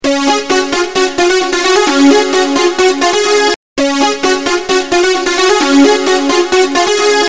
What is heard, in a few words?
Ring